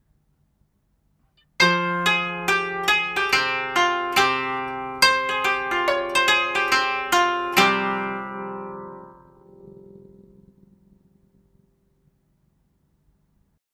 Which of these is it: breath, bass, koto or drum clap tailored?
koto